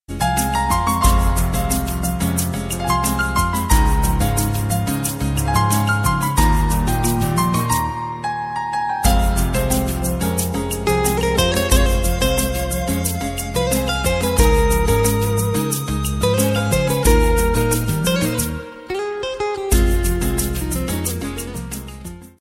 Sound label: INSTRUMENTAL RINGTONE